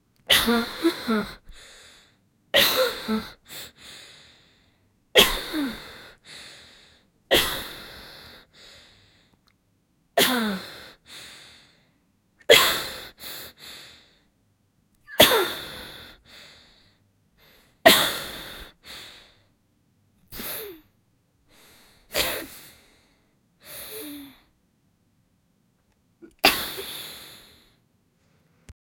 a female sneezing fit

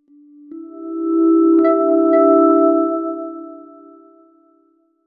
clean, logo

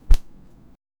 one poke on cotton very so

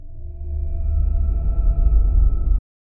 dark fantasy losing sound; metallic scrape

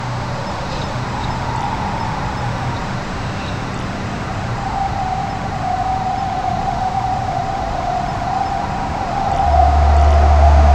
slow scuttling on rough

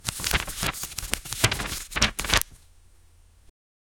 paper flipbook; daily life

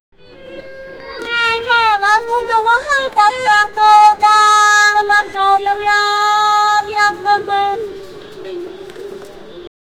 south indian funeral ambi

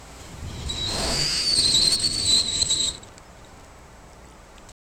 squirrels eager chitter

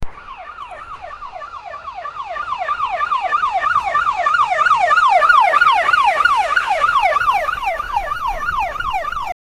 police car chasing car, helicopter

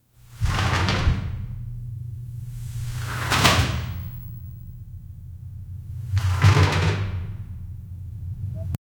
baseball player sliding

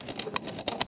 sound of classic cash mac, commerce

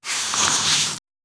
female hair dragging

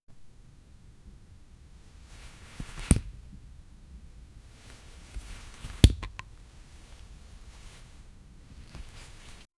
rope stretching, friction

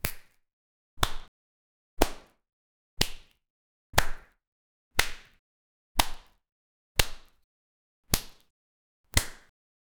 web slaps slapping thud

